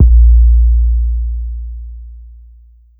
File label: Kick Sub